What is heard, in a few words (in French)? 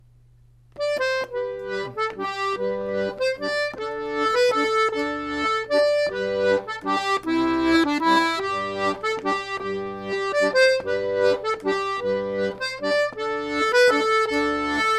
valse; rencontres-2014; the south wind